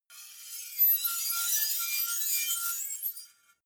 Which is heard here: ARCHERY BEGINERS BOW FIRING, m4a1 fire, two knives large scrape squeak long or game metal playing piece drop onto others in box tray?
two knives large scrape squeak long